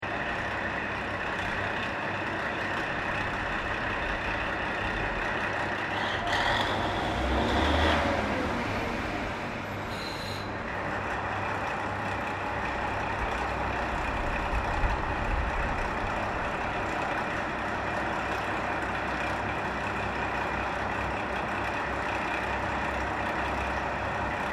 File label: Train Aomori DC40 Passenger Diesal Idle Loop, Japanese Trains; Vehicles